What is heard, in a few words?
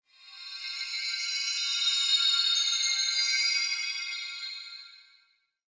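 fire, ambience; EmuClient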